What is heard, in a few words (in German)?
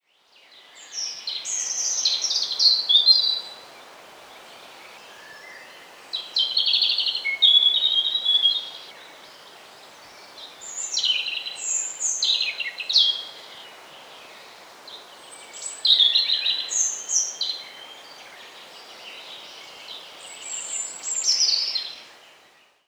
rotkehlchen gesang